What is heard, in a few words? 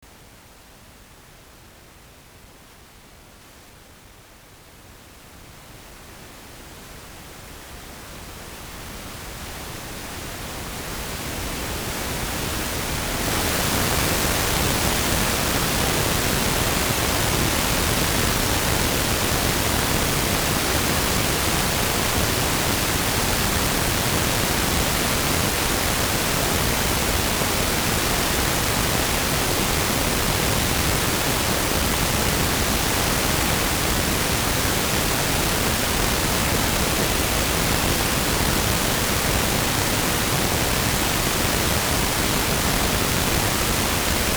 noise gen